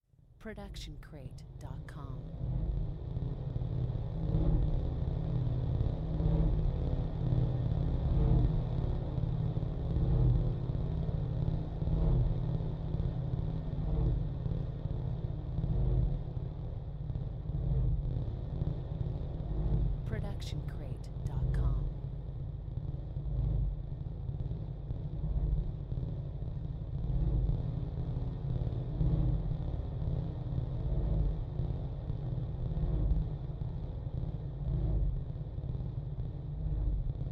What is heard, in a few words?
abandoned spaceship pulse; soundscrate